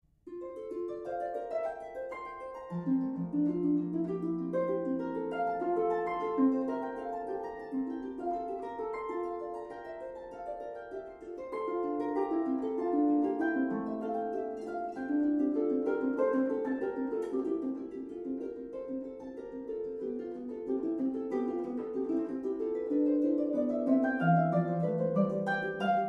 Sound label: F major sonata, harp; Scarlatti